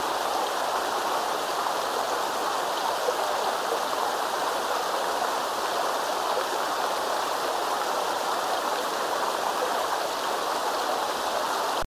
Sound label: waterfall narrow; windows gamerip 2009; The Sims 3